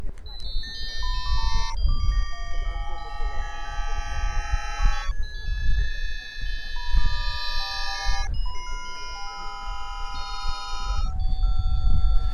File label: Teremin, optical theremin